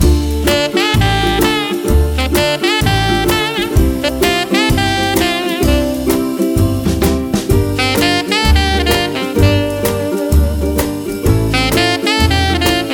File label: sax; saxpack3